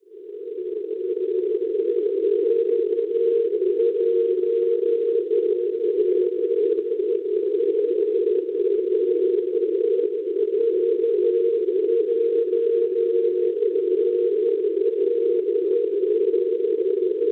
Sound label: NDB 328 kHz; VJ; Stauning Danimarca; Schwarzsee